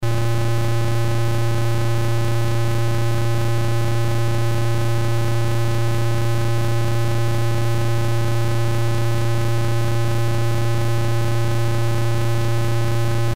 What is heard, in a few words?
Engine On; crazybus; genesis